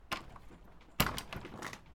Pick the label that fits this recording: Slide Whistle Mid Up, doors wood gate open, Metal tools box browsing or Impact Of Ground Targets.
doors wood gate open